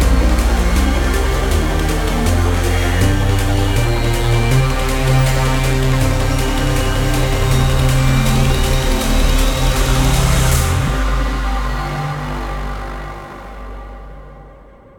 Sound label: Sonar Fight Success 15 Bars 160 Bpm